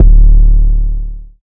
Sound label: 808 punch D, Tonal; Hip-Hop; Slate; CAICOS Hip-Hop Sample Pack